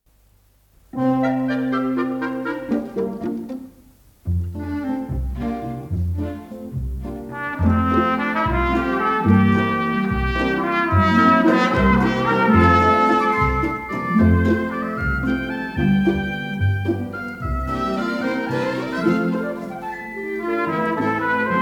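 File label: school waltz, variety orchestra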